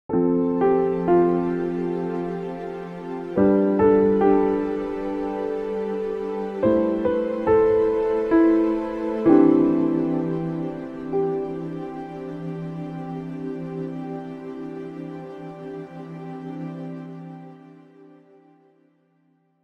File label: Ambient Worship Pad